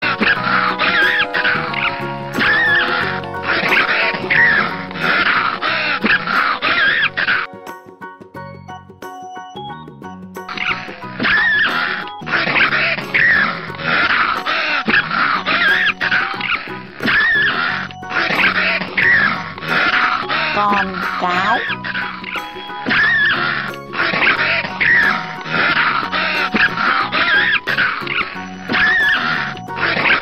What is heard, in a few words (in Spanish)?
zorro